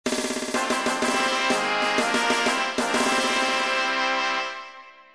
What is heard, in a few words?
Victory Fanfare; FF7